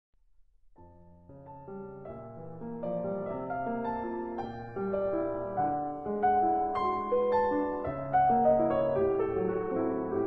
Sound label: fantasy for piano